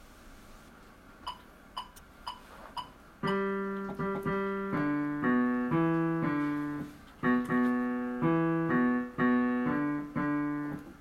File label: Bass